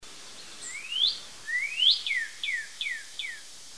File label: cardinal